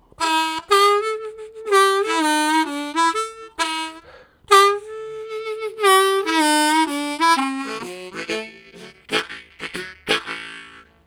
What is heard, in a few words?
Low Breathing, Harmonica; Zero G - Harmonica; Riffs